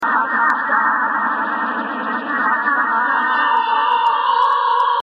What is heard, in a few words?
Alien Dispair